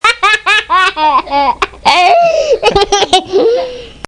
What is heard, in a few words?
Laugh